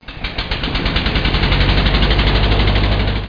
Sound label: big engine; MacAddict; Stay Tooned Demo